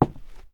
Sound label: boots wood; Apotheorasis